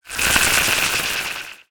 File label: Oscillating Sci-fi Transition